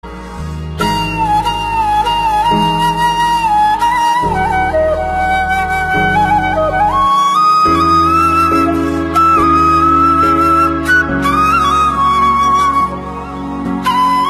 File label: bansuri